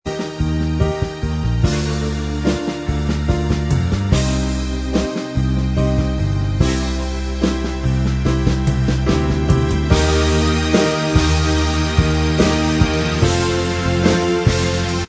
Inst Track

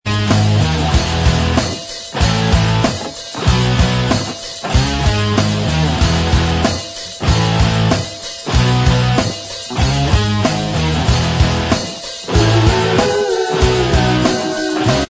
Bvox

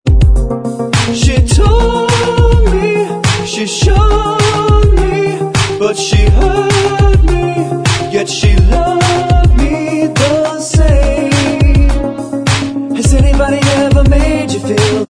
Bvox Track

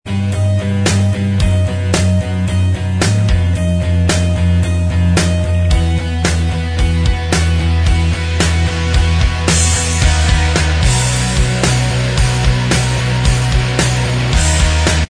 Inst Track

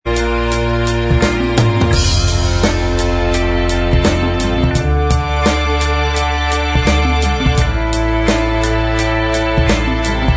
Inst Track

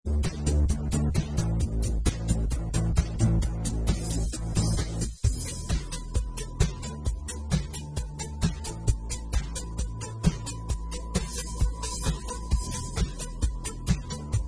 Inst Track

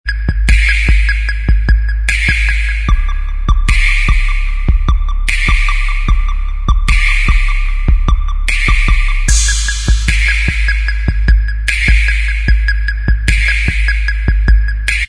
Inst Track

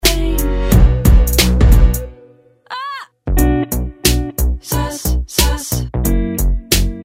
B Vox

